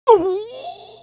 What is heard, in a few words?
damage, pain; mohaa; dialogue